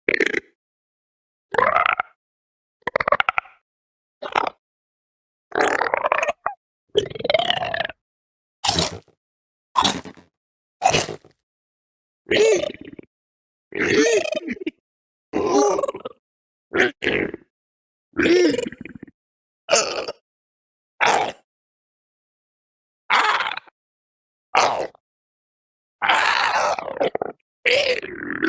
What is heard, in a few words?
Strider; استرایدر در ماینکرافت